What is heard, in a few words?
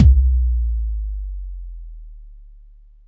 BOOM, BASSDRUM, Techno 138 BPM; Best Service ProSamples vol.54; AKAI